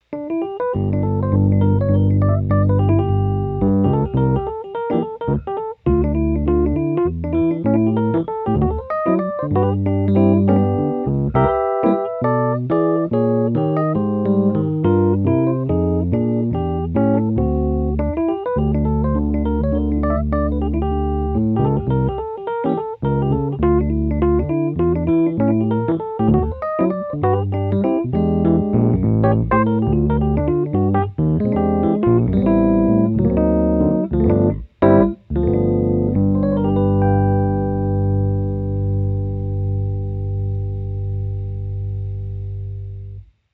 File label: no sustain; Seafoam